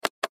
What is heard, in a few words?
click